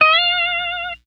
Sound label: Guitars, F; Total Drum&Bass; 90 s Sample CDs